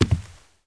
land1, step rug; KINGPIN; player

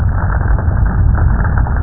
fire

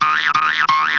POGO

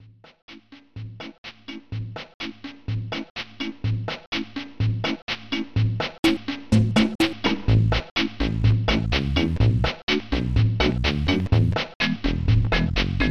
samba; Digital Symphony Collection; beat

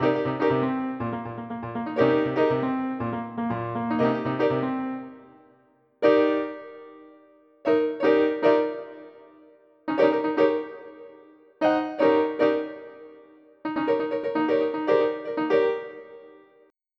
Funk; EuroCD 3

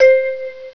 vibes C5; Star Trek JR Audio

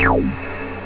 drop, acid

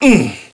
pain, player; SINDEMO; blade

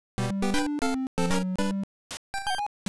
STARTUP; smb3

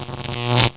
electrc

